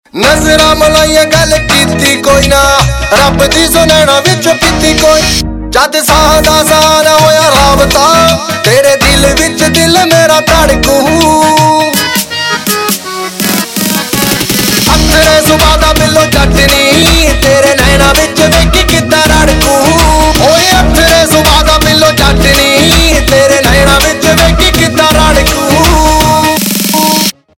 Ringtone, Punjabi

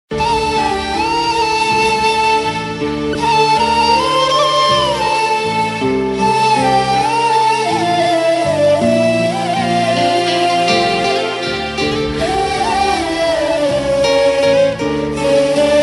Sad BG Tune; Zee TV; Kumkum Bhagya; Pragyas

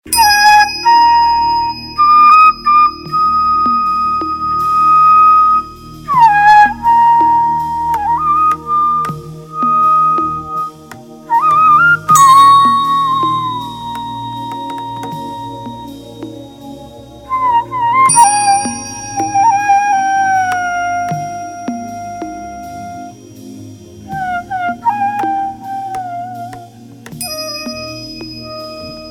Vande Mataram Instrumental; Gandhi Jayanti

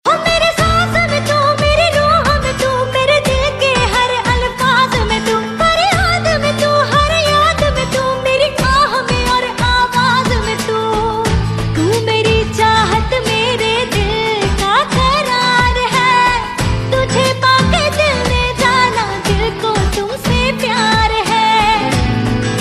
Female Version; TV Serial Tone; Life OK

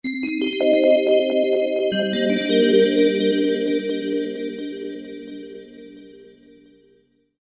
Chimes N Bells

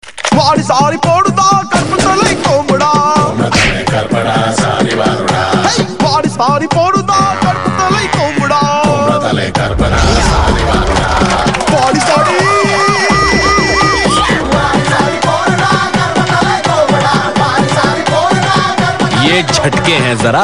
Bingo Ad Tone, Ringtones TV ADS Tone

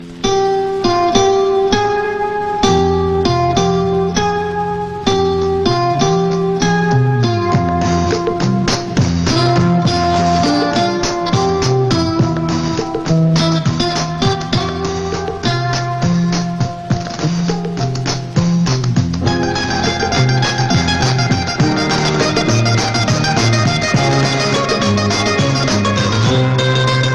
Guitar; Ringtones

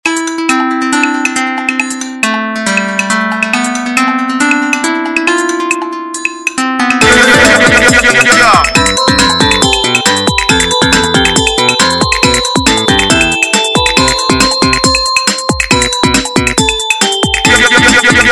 Simple Tone Mix; Airtel Ringtones

